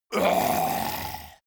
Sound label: monster growl throaty; kreaturen